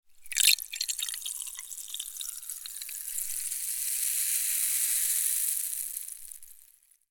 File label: pour